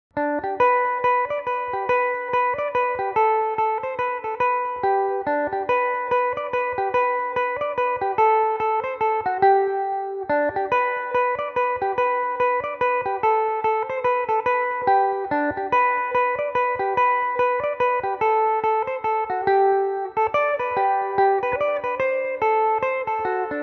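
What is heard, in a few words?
mazurka G major belmuse, irish mandolin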